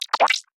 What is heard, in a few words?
Computer Vocal Morph; B00M ONE